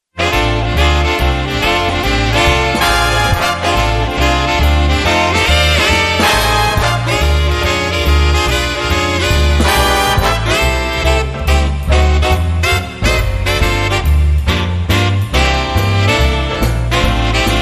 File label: Swing Out